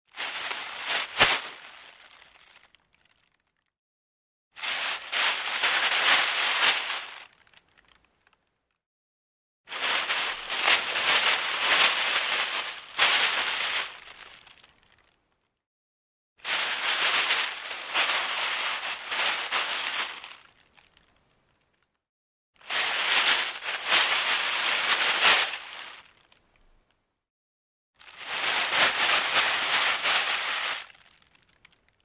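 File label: plastic bag sound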